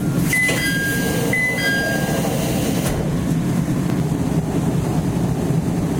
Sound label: chime door; tetsuon